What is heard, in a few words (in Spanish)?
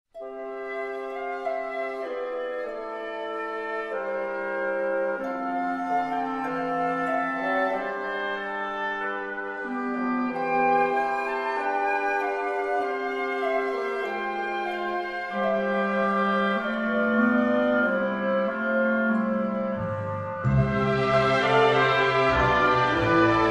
Himno de Bosnia Herzegovina